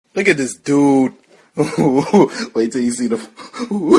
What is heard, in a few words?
laugh; look at this dude